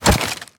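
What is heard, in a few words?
melee attack gun large; viper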